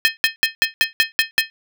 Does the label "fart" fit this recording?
No